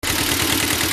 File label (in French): moteur; 206 cc